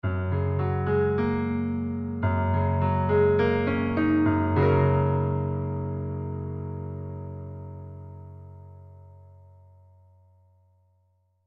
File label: Piano Accent Melody, Piano Accent Melody Thoughtful; Pianos; Musical; Blastwave